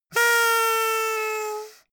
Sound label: hoot sad